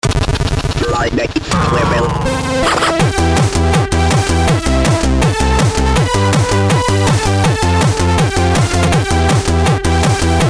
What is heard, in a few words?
8 Bit Stylez; UEBERSCHALL